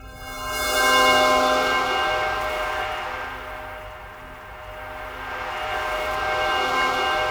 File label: OCEAN CHIME; Distored Reality